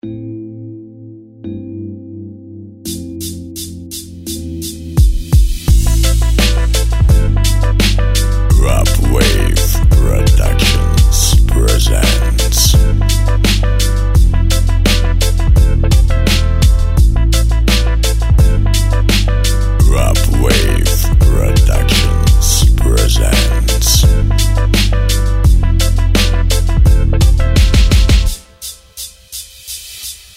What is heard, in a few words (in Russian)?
Street sketch, dushevny j kachevy j bit